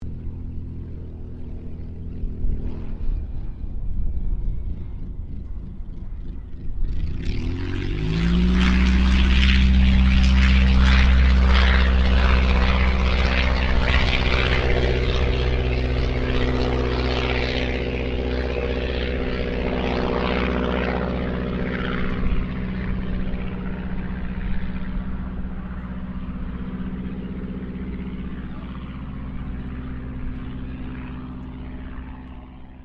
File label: AIRCRAFT DH82A DEHAVILAND TIGERMOTH TOUCH AND GO INTERIOR LOOP; RAAF Tigermoth; Airplanes; Vehicles